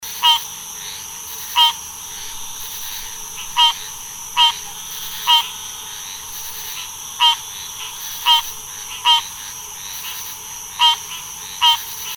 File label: green tree frog